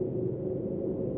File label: Crystal; Terokkar; Doodad